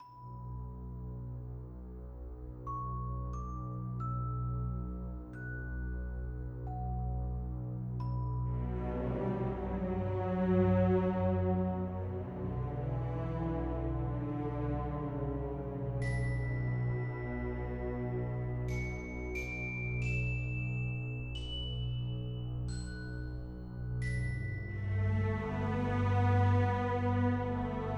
Title Screen w reverb

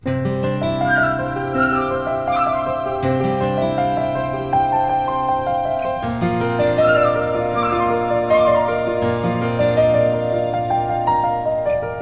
P V P Waiting Intro

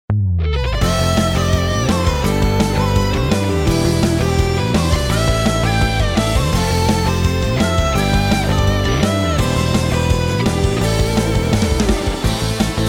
music; Attack Heroes

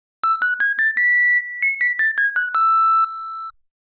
Ocarina; legend of zelda the link s awakening original soundtrack

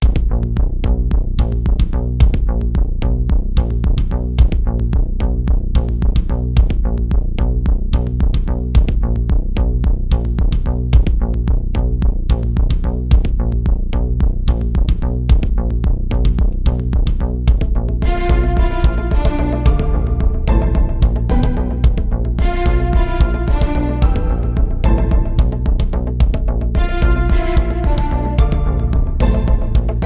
Flat forest Enemy

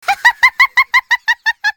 Caramel Miss Sound; Jolly Battle; Android

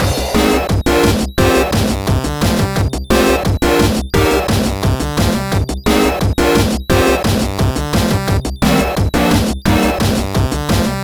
Item Get; SanctuaryRPG

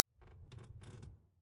Under Bed Peek

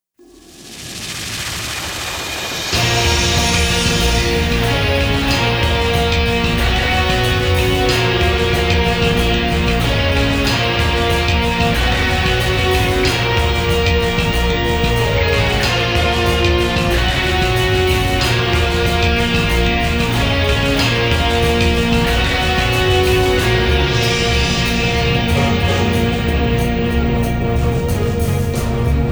Combat; Planetside; Planetside Combat 5

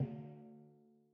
Footsteps On Eater; Forest SFX

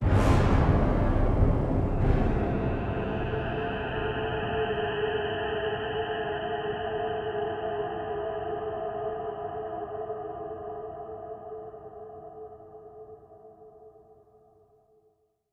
Stingers - Scary; Planet Alpha

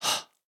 UI Pause Breath

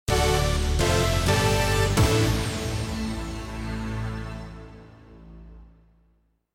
stock market power; Blue Collar Astronaut

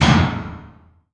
break piano keys; stranglehold

